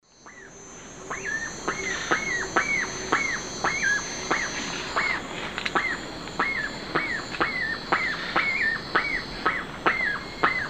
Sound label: Pipile pipile, alarm calls; S. America; Eastern Lowlands